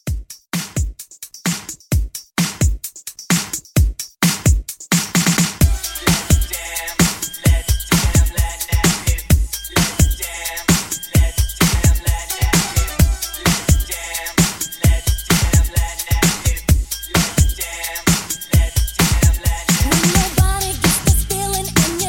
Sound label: 90s Old School Hiphop Re Drum